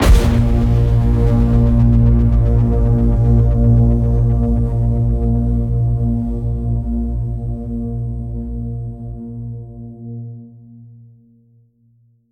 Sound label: HIT SciFi, Cinematic Hits Designed